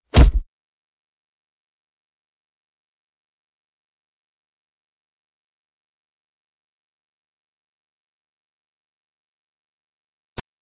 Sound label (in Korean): Fights, 싸움-전투; 헐리웃특수효과음